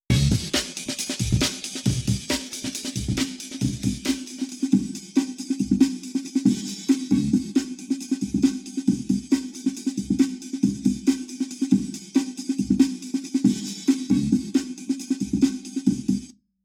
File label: bowl dry Wet; DPA omni